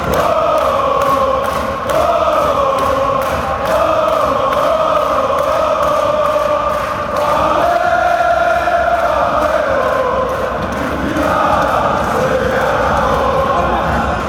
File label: panathinaikos chant